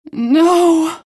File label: pain death; queenofpain